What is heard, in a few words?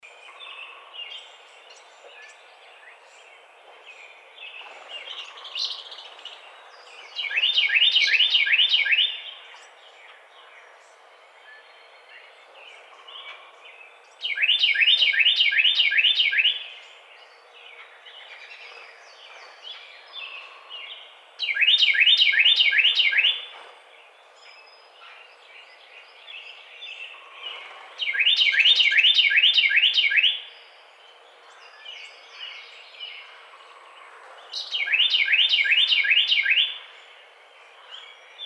Carolina Wren